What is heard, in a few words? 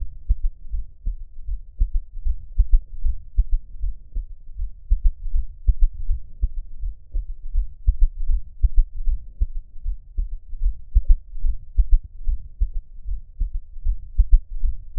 s1 soft